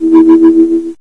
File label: radar; Kenney's Sound Pack; Retro Sounds 1